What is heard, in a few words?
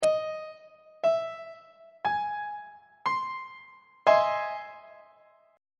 d double sharp augmented major 7th 3rd inversion on treble clef